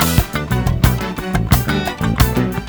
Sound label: funk loop